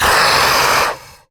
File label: Pain, Barks; Warframe; MiTW